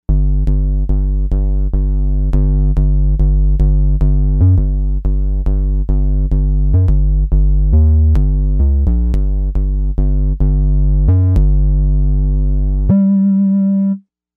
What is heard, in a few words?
Dist Sine; Novation UltraNova; Factory Patches